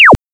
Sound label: Collision; spacefortress